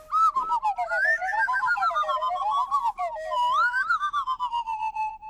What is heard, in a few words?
ringtone; clangers; the clangers phone sounds